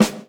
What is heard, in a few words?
classic jazz acoustic snare g sharp key